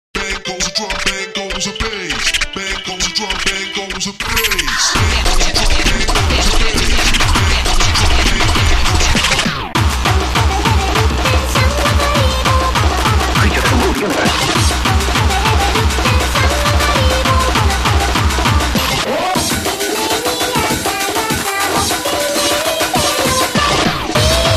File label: hardcore